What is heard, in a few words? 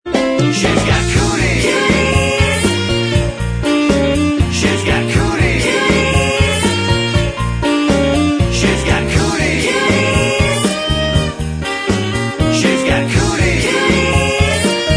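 backing vocals track, backing tracks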